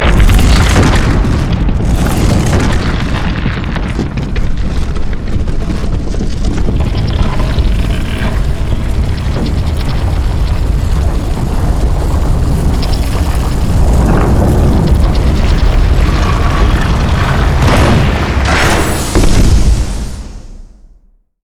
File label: stair extend; odin